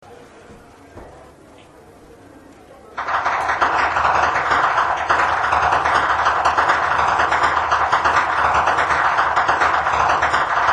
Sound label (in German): schnalzen, flachgau; anthering